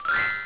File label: magic